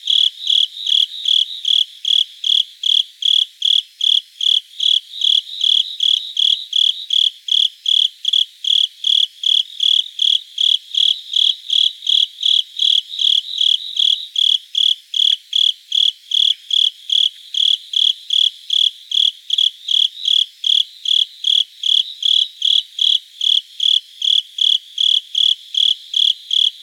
Velarifictorus micado

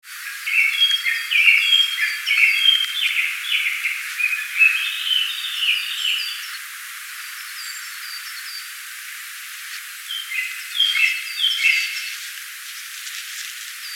Turdus cardis

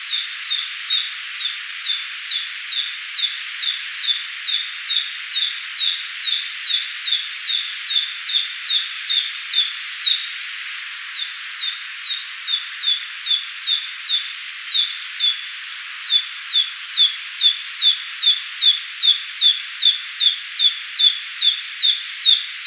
Ficedula narcissina

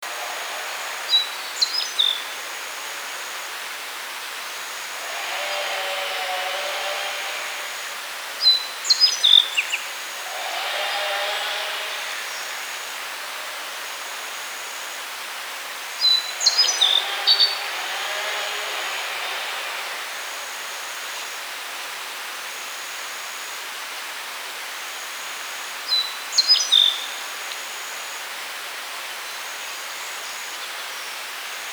Emberiza spodocephala

